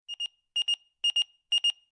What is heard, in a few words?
timer done